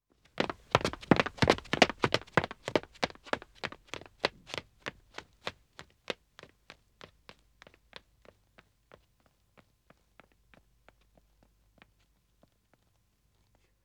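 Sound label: Wood (Dead Acoustic) Running 2 men, Footsteps; BBC Sound Effects Library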